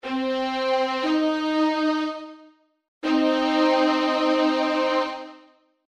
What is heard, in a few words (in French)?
3ce min, intervalles